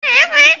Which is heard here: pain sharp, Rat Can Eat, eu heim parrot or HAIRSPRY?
eu heim parrot